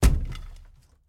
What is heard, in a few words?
reel Stop; lost relics